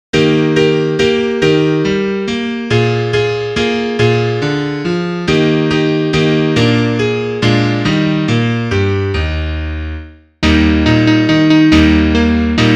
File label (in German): Klavier Solo, trompete; (Duett)